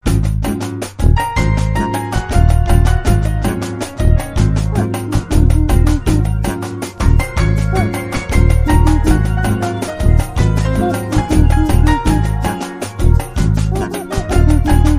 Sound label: Samba